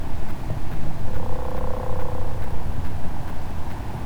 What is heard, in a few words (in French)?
bruit vocalise; BOMBYX